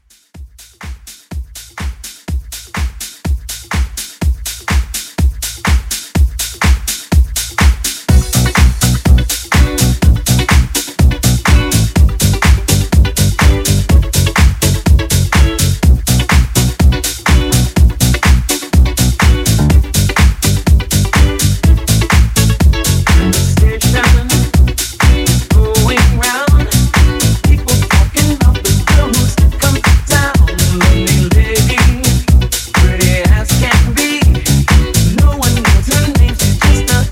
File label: House Dance Re Drum 124 CLEAN